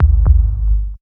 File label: Repeat Bass; Zero-G Jungle Warfare 1, 2 & 3